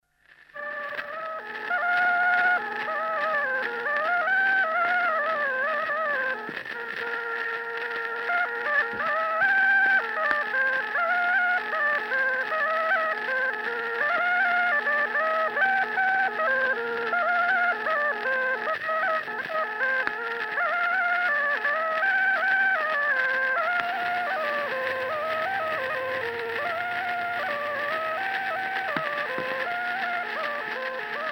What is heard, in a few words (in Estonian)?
Trallilugu torupill folk; Kuusalu